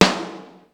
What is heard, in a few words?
Ludwig Snare, Ensoniq ASR-X Pro drum machine; 300 Drum Machines